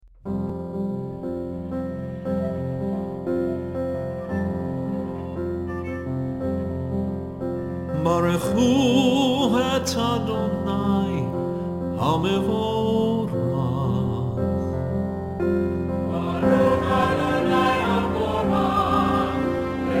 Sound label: barchu mix